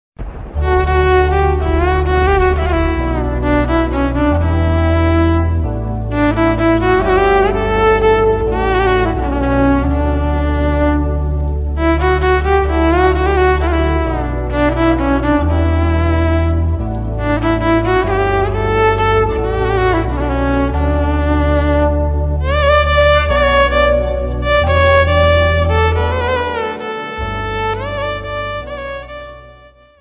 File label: christian ringtone violin cover; jesus status